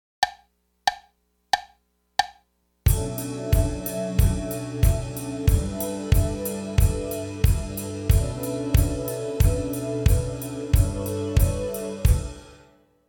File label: bass ride, drums